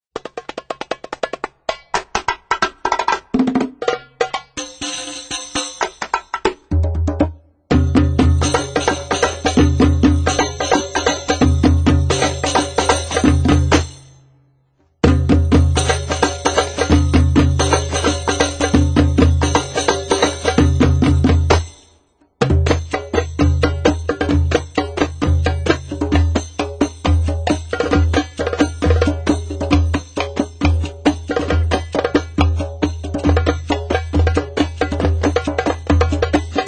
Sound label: Sabroso Drum Solo